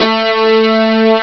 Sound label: d50 lead, Amiga Soundtracker Sample Packs